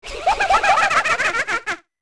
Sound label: Laugh Appear; Skull Kid laughing while appearing